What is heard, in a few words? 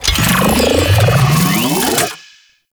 Shield Recharge Activate; Enemies; Abilities; Warframe; Amalgam Override